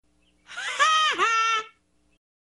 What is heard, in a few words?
ha ha!